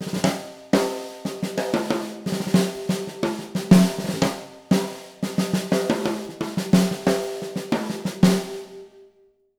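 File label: breakbeat, 120; Jazzistic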